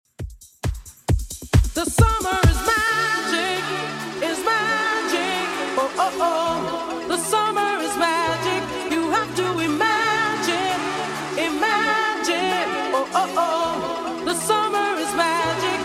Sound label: 90s Redrum 134 bpm